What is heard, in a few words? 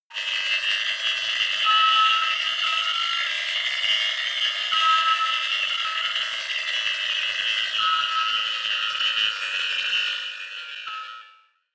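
horror sonar, pads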